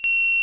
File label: Buzzer; mcs51